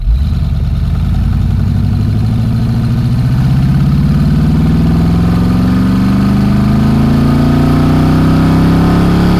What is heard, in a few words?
EXHAUST ACCEL